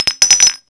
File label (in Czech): Sklenice